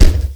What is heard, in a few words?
human punch no hit; theodyssey